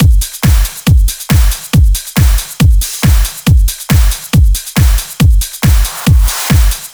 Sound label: NuTrance Kit 1, 138.5 BPM; Vengeance Samples